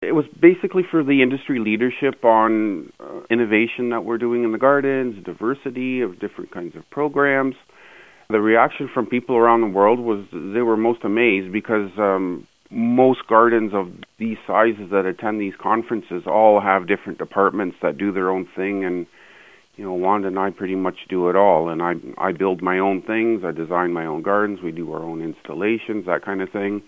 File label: whistling